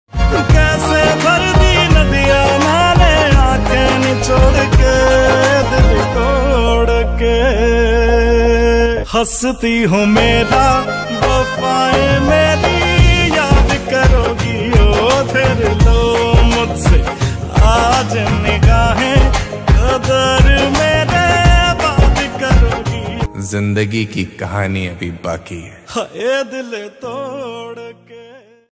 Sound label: song ringtone